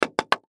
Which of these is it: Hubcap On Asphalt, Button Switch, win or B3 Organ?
Button Switch